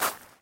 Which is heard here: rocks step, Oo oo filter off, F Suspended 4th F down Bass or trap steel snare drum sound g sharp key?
rocks step